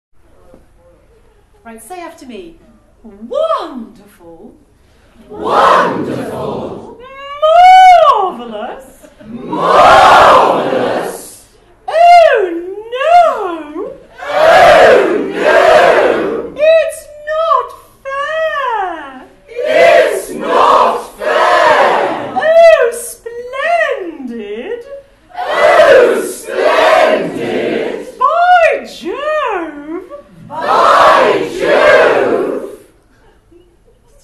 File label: Open Vowel Sounds